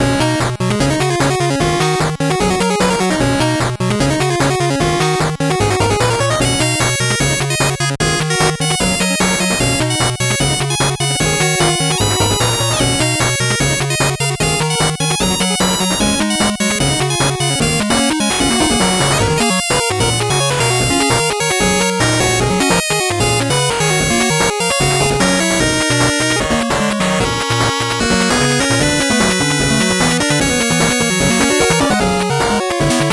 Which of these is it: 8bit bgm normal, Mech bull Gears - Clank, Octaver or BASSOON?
8bit bgm normal